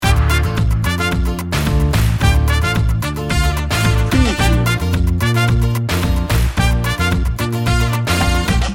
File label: latin salsa merengue loop